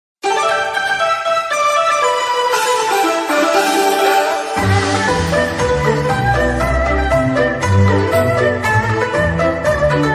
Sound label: chinese mobile ringtone